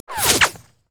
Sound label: basicattack; jinx base